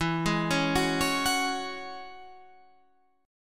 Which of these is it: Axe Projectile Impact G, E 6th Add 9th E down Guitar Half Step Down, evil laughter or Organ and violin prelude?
E 6th Add 9th E down Guitar Half Step Down